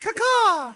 cacaw

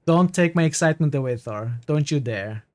excitement

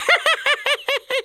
hehehe

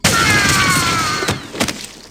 burst

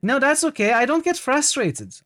frustrated

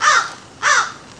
caw; Animal Sounds; Various Animals; Short calls

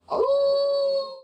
awoo

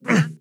grunt

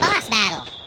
boss battle

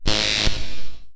zap

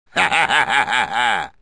haha

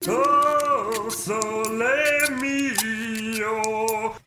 o sole mio

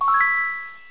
quest complete

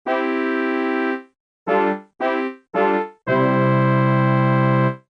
fanfare

